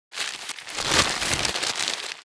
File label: Newspaper crumple